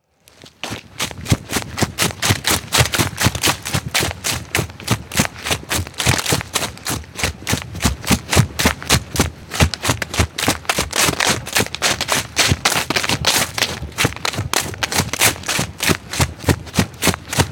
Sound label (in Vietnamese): tieng buoc chan chay tren bai co phu day la roi